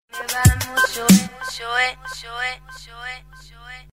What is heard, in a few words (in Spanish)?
cortina; elcamino